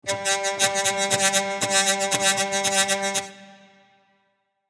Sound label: Hurdy gurdy drone reps